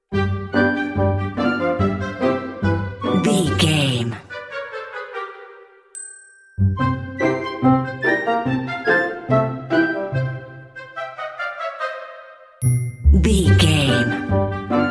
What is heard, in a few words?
woodland critters play